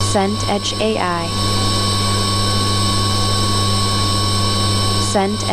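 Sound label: ambience hospital ward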